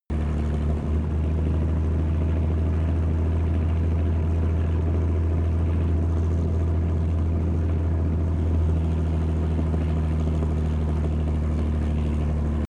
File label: tank large engine fast speed steady